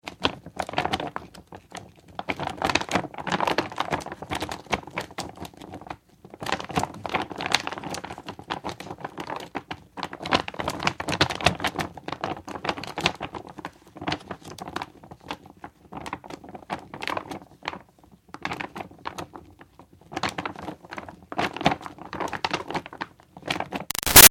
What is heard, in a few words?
Flag Flapping Small Gift Bag, wind; Weather; Big Room Sound